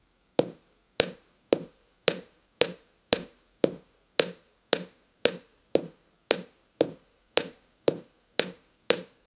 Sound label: single human footstep, boots; sound effect